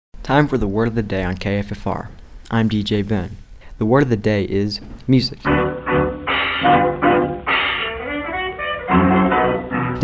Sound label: Music